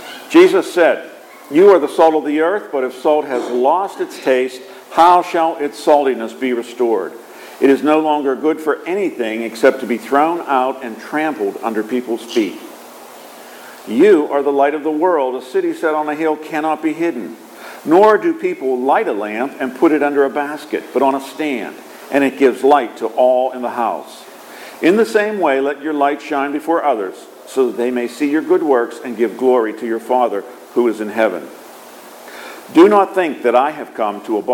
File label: Gospel